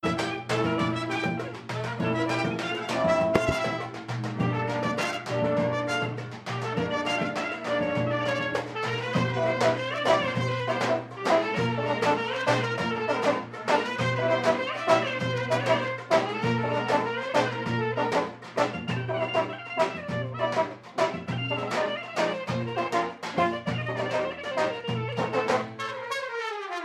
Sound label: thailand shuffle rehearsal